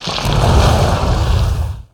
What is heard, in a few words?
Rock Elemental Attack